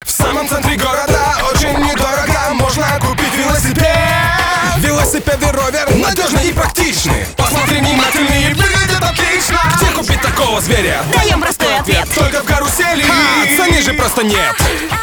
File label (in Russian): РЭП; Карусель